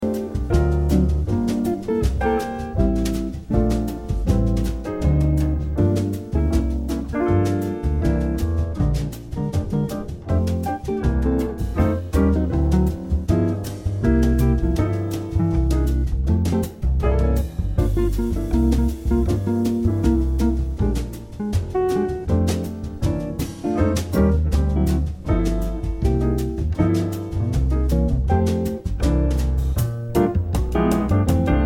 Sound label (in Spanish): Musica Jazz Instrumental